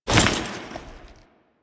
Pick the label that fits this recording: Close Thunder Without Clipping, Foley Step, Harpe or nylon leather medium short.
Foley Step